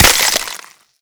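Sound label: icicle break medium, explosions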